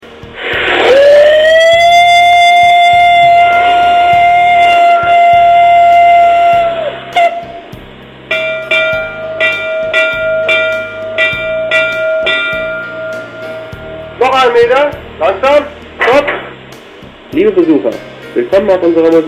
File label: Feldbahn Dampflok, Industrial Field Steam Loco